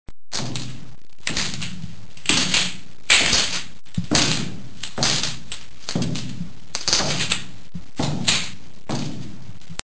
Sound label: footsteps